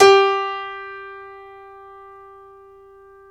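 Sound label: piano, Grand piano; Club-50 - Foundations Roland; Grand Pno 1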